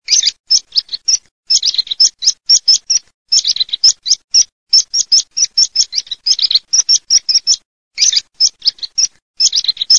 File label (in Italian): PISPOLA PISPOLE, birdsound